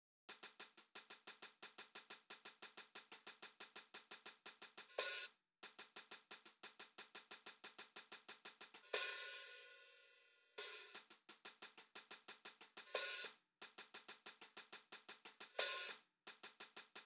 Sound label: hihat